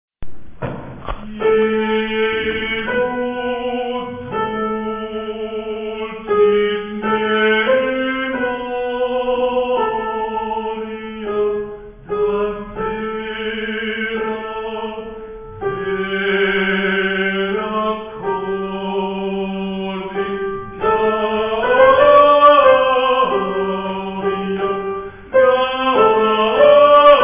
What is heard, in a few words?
Jesus dulcis Soprani